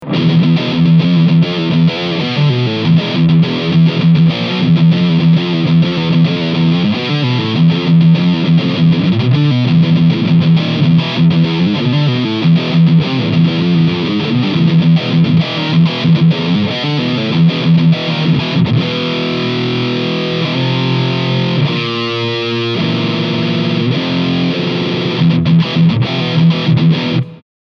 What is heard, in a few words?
Blackstar Silverline Special OD1 6l6